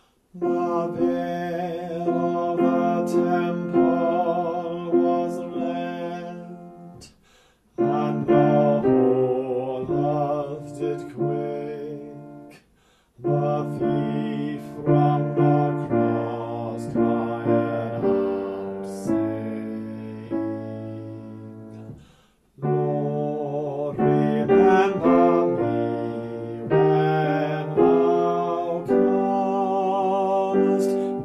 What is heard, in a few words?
bass